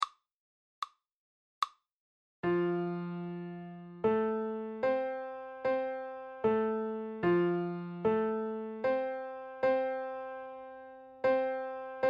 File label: major triad; intervals